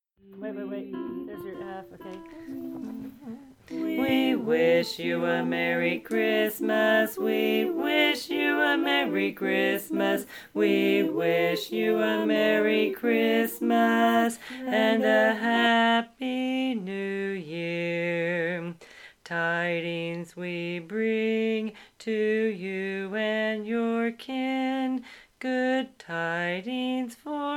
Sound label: we wish u bass